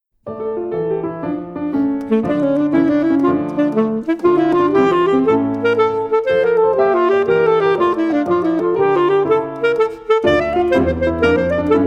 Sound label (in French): Escapade Sax Ronde celtique, saxophone; escapade musicale saxophone